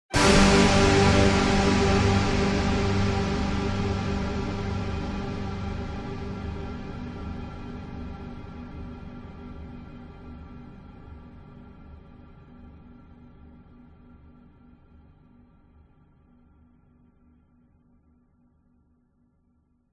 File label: sound design cinematic stab orchestral hit dramatic sudden